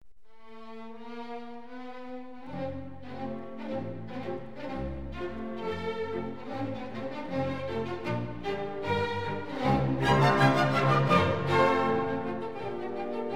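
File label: orchestra in F, allegretto vivace; m schmeling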